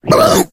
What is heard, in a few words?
female boomer pain; player; voice; source engine; left 4 dead 2